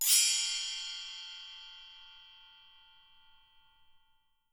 Percussion & Harp; Miroslav Vitous

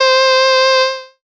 Dance Synths, Acid Bubble, c

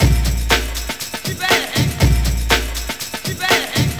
hiphop

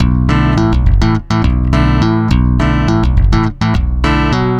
Stick Loop, Stick Bass

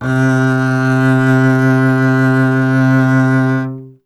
STR CBS, Solo Instruments; Cbas; Miroslav Vitous - Solo Instruments VOL-2

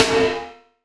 Snare, Gated Reverb; creative gate; musicradar

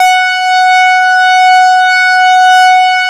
leads; Masterbits; Vintage Voltage

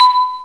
Eraser Wavy Dissolution; Fun 'N Games

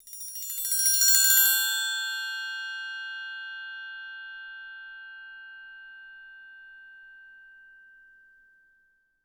TRI CRSCHI, orchestra; Best Service; Peter Siedlaczek's Advanced Orchestra

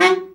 BRA Bari, SAX; Best Service Gigapack 1; BR SAX 2

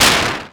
Percussive, Drums Perc One Shots; Sonic Foundry (Sony Creative Software)

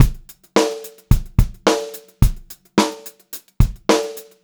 Pop-Rock Drumloops 108 POP BEAT; USB Soundscan vol.37; AKAI

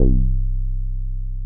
C1, Bass; E-MU Producer Series Vol. 6 – Analogia Project (CD 1); Boom da Bass 2

